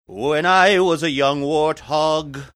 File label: singing when i was young warthog